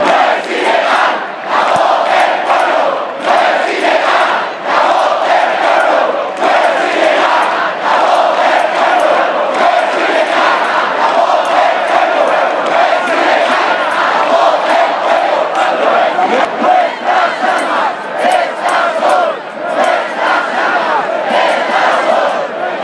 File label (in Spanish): el sonido del pueblo enfurecido